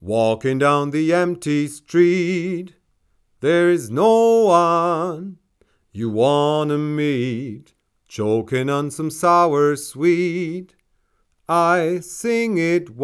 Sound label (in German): Male Vocal Kugel 25 cm; Universal Audio Bock 251; V376a